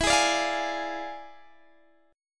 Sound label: E 7th Flat 9th E down Guitar Open G